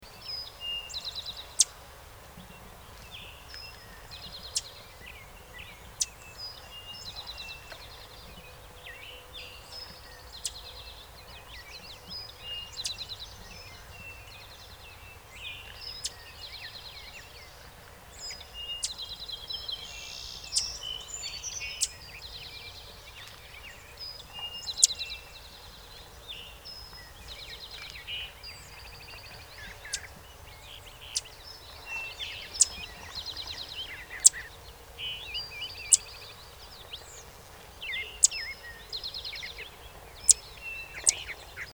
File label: Golden-winged Warbler, Vermivora chrysoptera; warblers